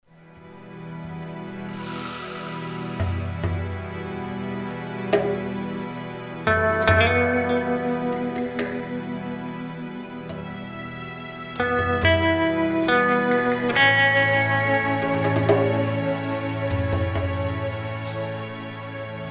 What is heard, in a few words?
Western; Nancy Drew Mobile Mysteries: Shadow Ranch